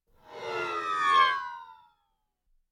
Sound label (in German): Eruption; Star Wars